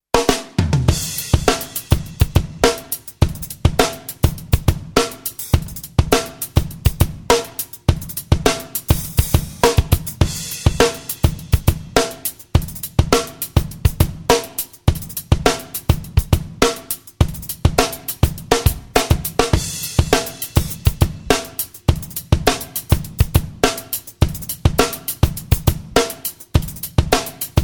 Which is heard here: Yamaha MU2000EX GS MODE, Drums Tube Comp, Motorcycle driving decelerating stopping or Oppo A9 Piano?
Drums Tube Comp